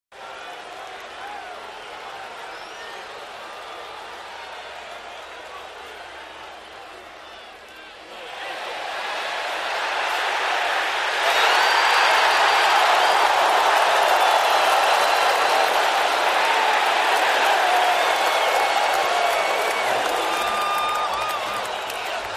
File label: Football Crowd Idle, sports arena football